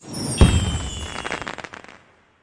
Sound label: new Equip